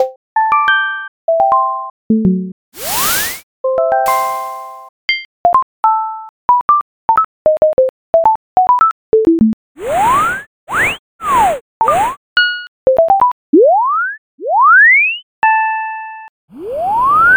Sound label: ui sound effects; creator ui sound effects pack pro